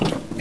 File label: SQUEAK; Matrox M3d